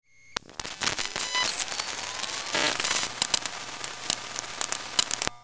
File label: Laptop glitches and noise